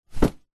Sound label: clothing item jumper drop on floor; zapsplat